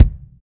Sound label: kick